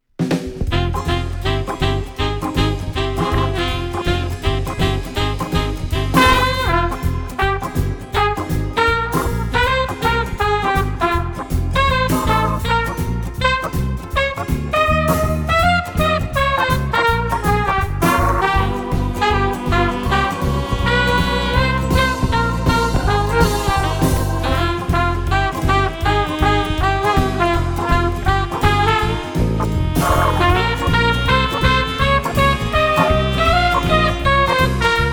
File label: Big Band Jam, jazz